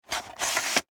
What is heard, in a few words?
Vhs Cover Insert; Tape Players; Electronics